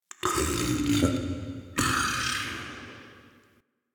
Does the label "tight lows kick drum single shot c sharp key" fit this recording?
No